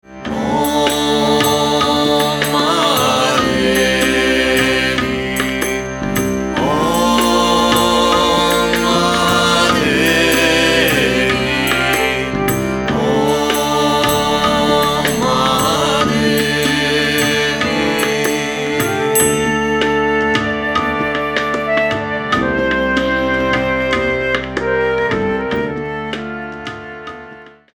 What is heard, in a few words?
Om Mahadevi